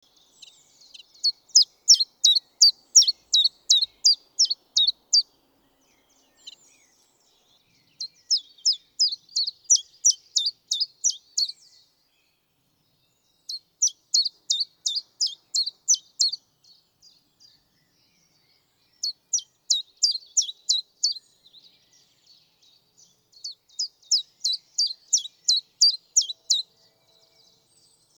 pouillot veloce

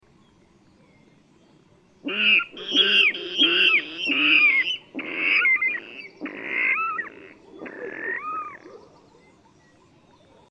rale d'eau

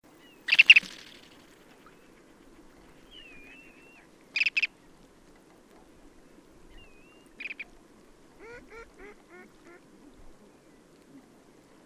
tournepierre a collier arenaria interpres

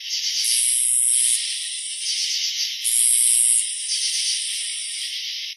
criquet

